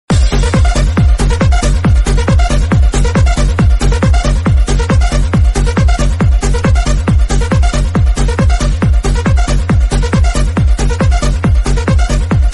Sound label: iphone 8 plus french ringtone